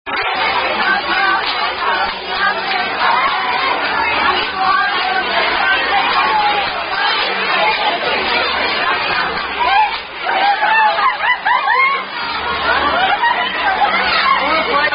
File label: Hand Clapping Game